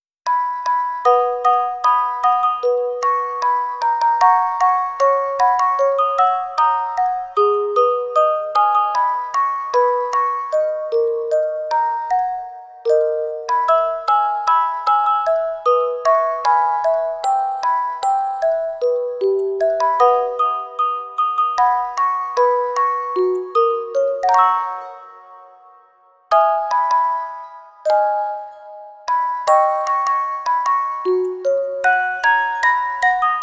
Door to the Future Orgel; supreme candy soundtrack cd

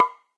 Empty Click

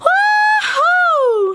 Emote Cheer; the princess and the frog; Twitch Skijump

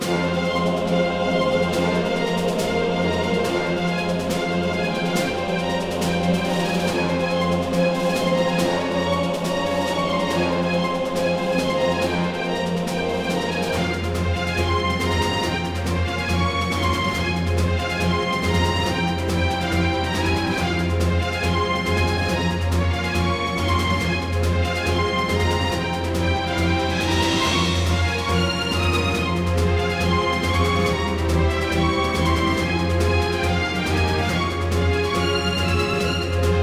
Miniboss Combat Loop; Music Fishermancave; Curse of the Sea Rats

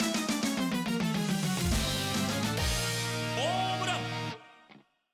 Homerun; pro yakyu famista 2020 switch gamerip 2020

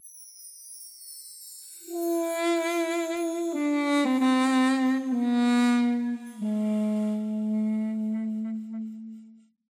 Duduk; Temple Rnd; Tintin Reporter - Cigars of the Pharaoh

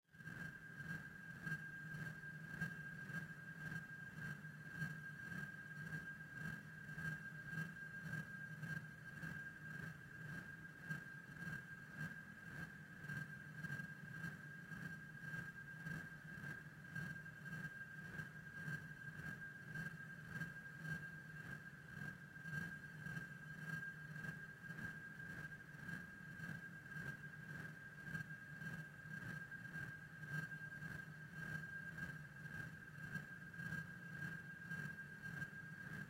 NOISE; rain world

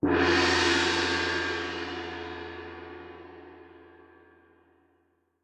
Gong; super skelemania

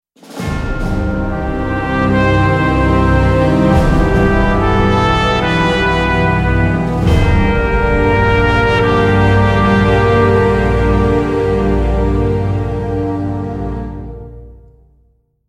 Battle Lose; willy nilly knight macos windows gamerip 2017

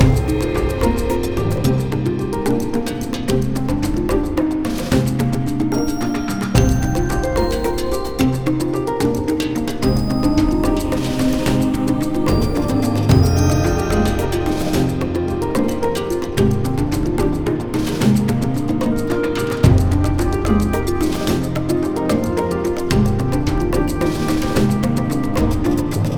Garden Idle LOOP

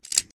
Revolver Wield

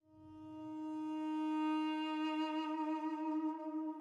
Calm Start 60bpm 1bar; project zomboid

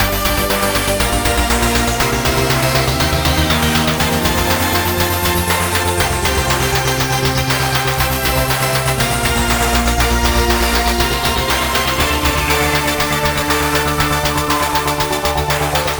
Level Theme Win; luxor evolved; ios windows

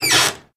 saloon Door; Toy Story Mania

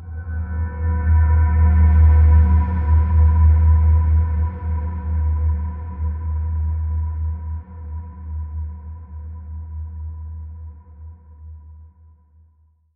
System Drones - Dronetail; 3030 Deathwar Redux A Space Odyssey

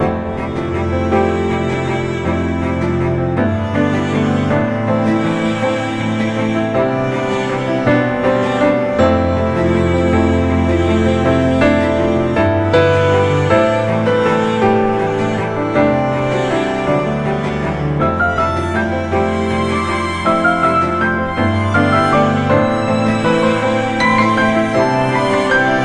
Music Level Florence

